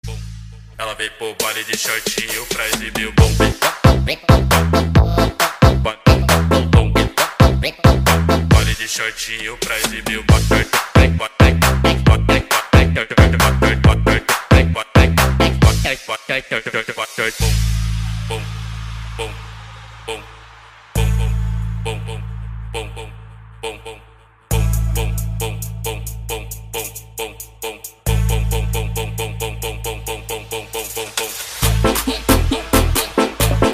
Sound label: Tik Tok ringtone